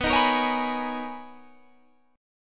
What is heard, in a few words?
B Major 13th B, Guitar, Standard; BM13 strummed